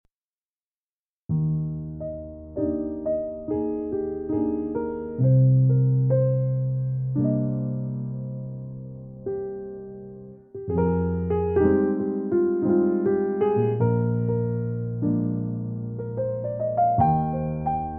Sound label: Jazz